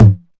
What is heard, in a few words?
low mid tom drum sound g sharp key